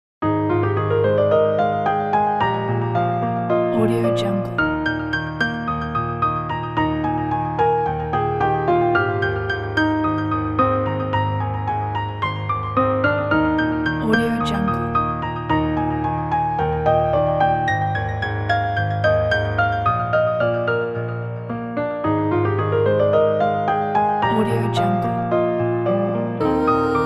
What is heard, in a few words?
uplifting; siselamusic